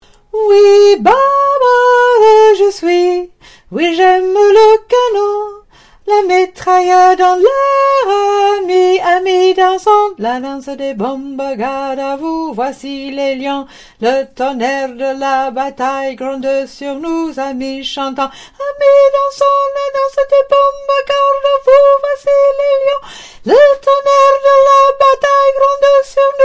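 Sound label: Soprano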